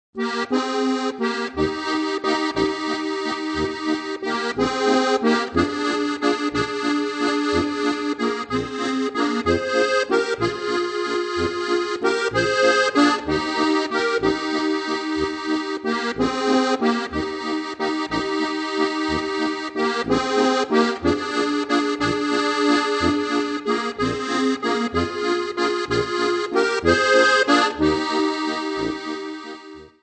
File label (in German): walzer